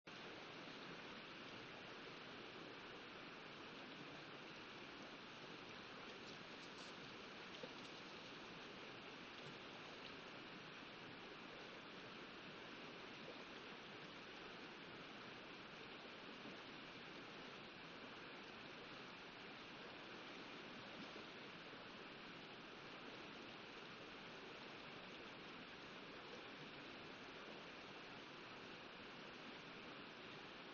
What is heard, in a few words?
riverside